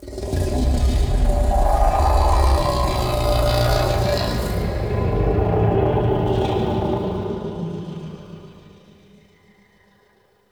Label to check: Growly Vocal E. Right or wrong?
Right